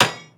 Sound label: Tnt Box Frame Break; angry birds rio